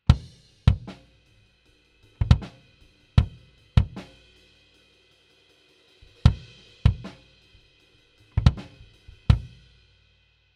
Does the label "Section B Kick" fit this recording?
Yes